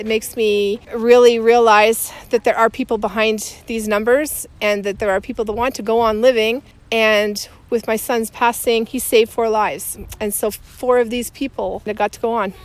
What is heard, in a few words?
organ